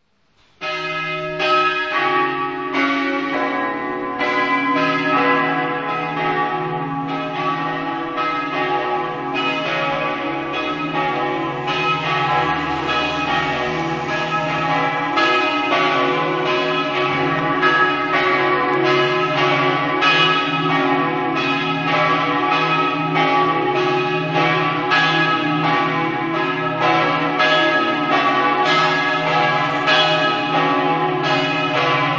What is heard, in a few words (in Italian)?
venzone, campane